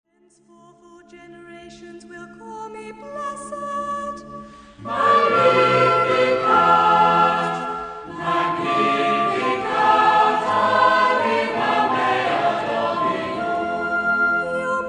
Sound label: Magnificat choral